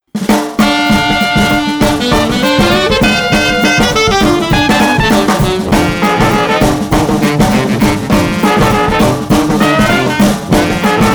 New Orleans Brass Band

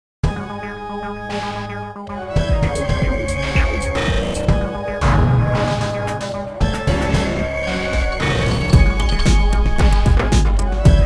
Organ 113

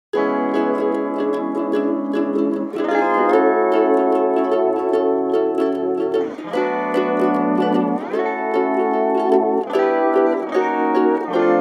Hawaiian Steel Ukulele Delighted 150

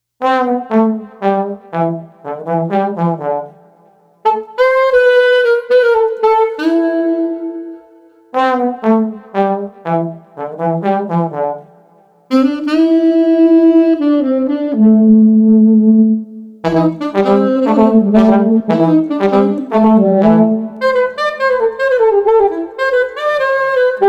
Street Trombone Alto Sax Lofty 120

